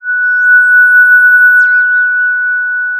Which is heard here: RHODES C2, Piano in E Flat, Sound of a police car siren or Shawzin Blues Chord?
Sound of a police car siren